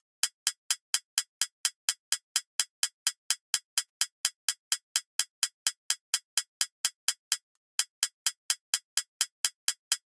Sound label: loop hat 127; alphonsina